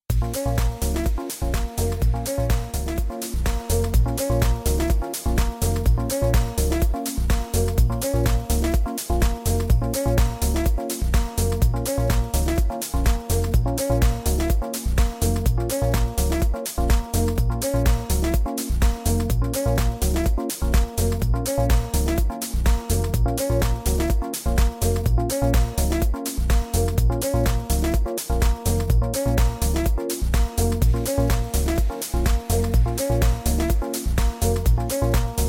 deep house

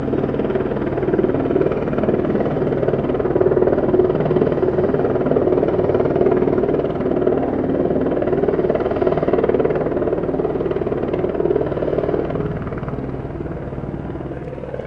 this helicopter was hovering over our backyard; for at least 5 minutes

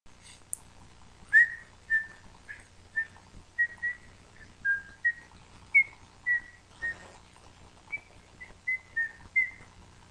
whistle

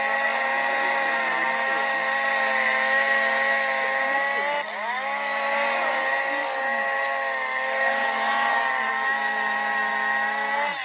chainsaw gun

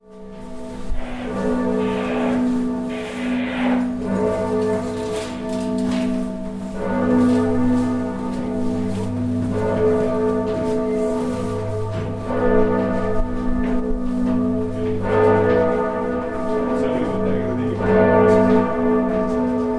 Florence campanile bell heard from inside

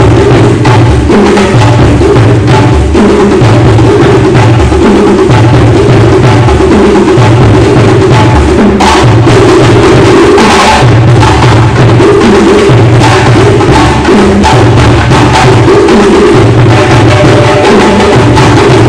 african dance class; at ace dance audition

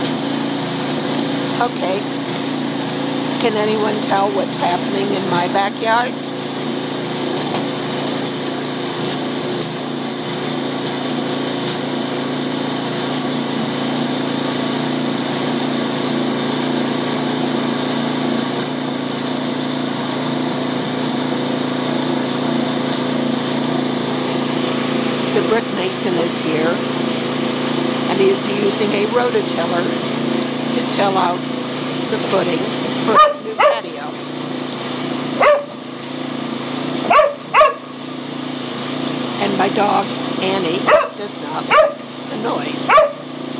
saturday morning sounds

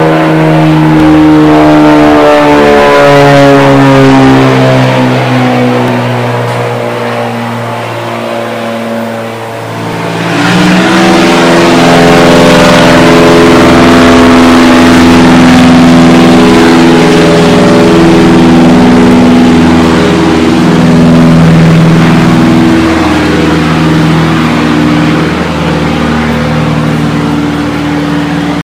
santa monica airport over westminster elementary

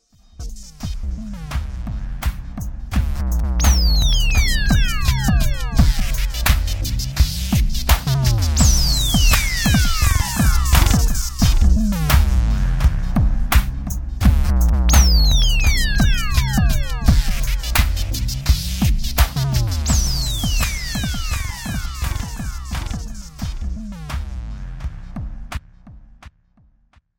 kaossilator noodlings beat filled

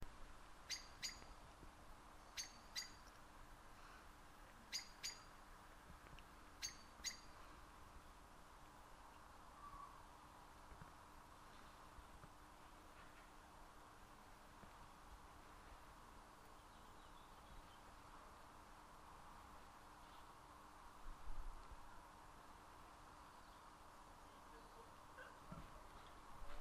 stereo blackbird alarm calls at dusk; near my flat on 15 october

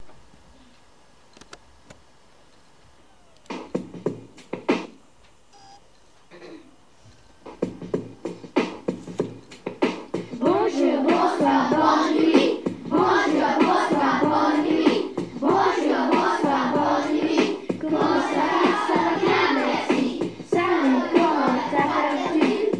greetings rap

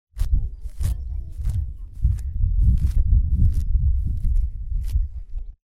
grass steps

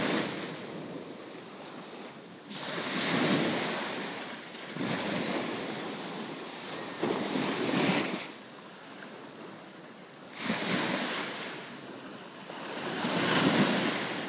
the gentle sound of the waves

